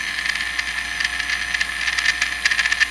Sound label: TOY ROBOT MOVE LOOP